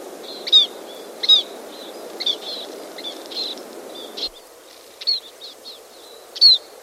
Scaly-breasted Munia

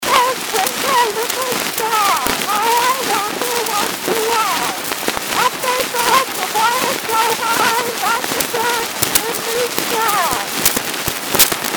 80rpm; edison

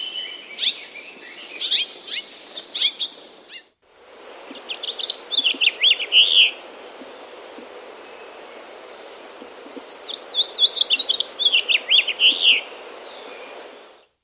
House Finch

